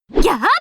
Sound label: slash; bb-mai